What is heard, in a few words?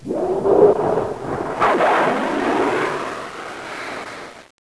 ship out; Easy Phonics